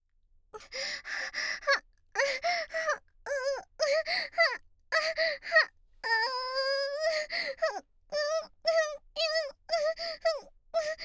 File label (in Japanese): 耳舐めでおやすみなさい(効果音なし); ギャルJKママの耳舐めでおやすみなさい♪